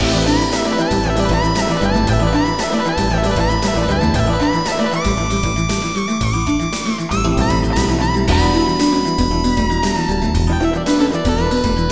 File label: ROCKNOUT